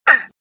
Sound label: land; worker1